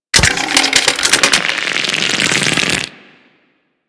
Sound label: Goo Gun Charge Up